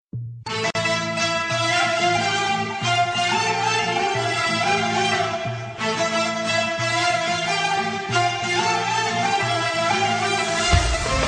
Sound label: Turkey Dance Music